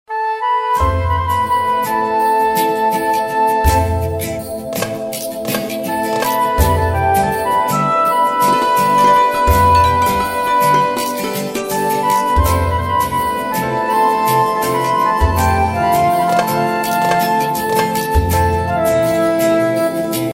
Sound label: Instrumental Tone; Aashiqui 2